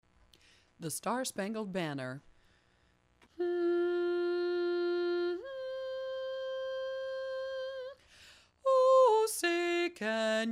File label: lead alone; SSB